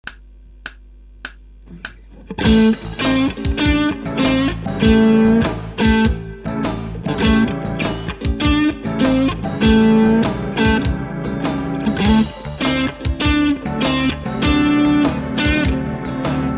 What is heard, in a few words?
Blues Etude